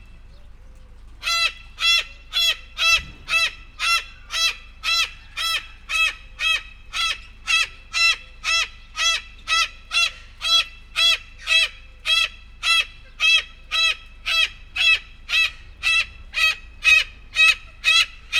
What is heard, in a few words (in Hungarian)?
inka kakadu; állathangok; nyíregyházi zoo 2010